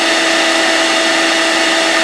light hover move; Army Men TiS

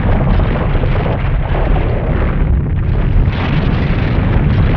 Loop; The Whell of Time; wotdemo; Angreal; Revista CD Expert 33

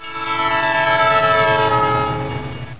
Train; hunter

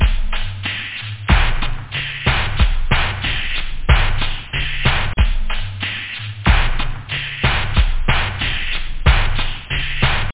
dance, house loop; da capo vol1

